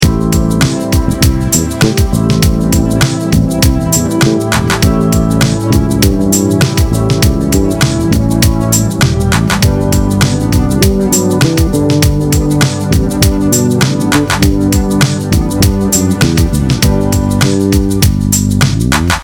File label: 221 G major, Ami7–A7–G; substitute the D7 for an A7; bass; chord substitutions